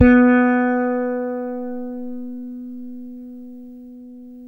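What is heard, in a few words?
fretless bass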